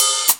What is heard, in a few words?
HAT; E-MU Formula 4000 Series Vol. 1; Hip Hop Nation; Bonus Perk